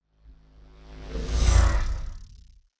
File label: sci-fi vehicle drive by buzz; raumschiff